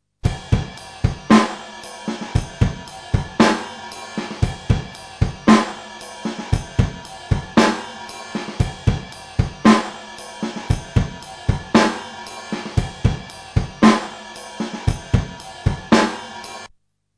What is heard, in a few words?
drum loop